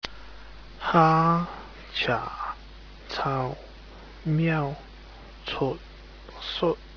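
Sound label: tone table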